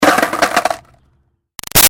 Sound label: Skateboard Drop Crash Bounce; Skateboarding; Sports; BigRoomSound